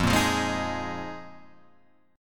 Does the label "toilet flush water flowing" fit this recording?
No